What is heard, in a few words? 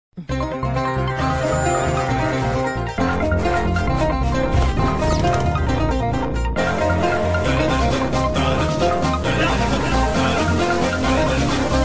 Love Action Drama Bgm Ringtone; ringtones